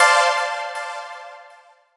Music

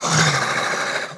Monster Breathing; Monster Lizzie

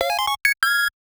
1UP!; Sanae's Sylphid Breeze Unofficial Soundtrack

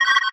Purple Phone Ring; viewfinder

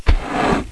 Glyptodont Walk; Knight Online

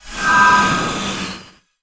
Magic Shine Light Spell

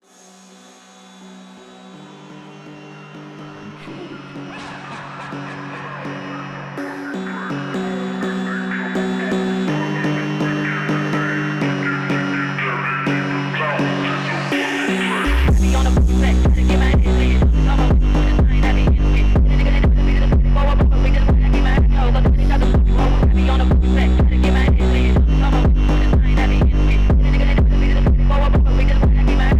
Phonk; JDM Japanese Drift Master

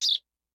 Obstacle Bird Move; hello kitty friends match; android

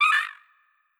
Hawk Vo Short

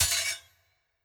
Slave Fall

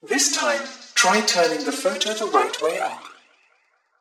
Glitch; viewfinder; Glitch First

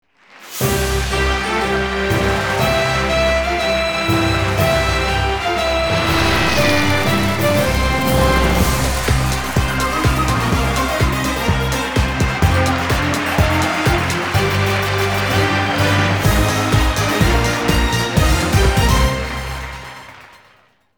Intro fanfare; Buzz! Brain of the World; Buzz! Il Quizzone Nazionale; Italy; PSP

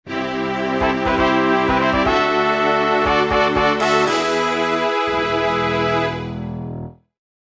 Fanfare; Elin

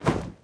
Grizzly Bear; Rabbids Multiverse

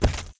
Geo Rock Hit

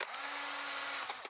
Mounted-Camera Photo Print

